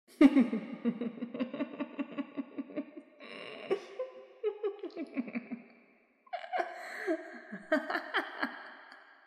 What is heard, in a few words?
evil laughing woman sound woman laugh sound effect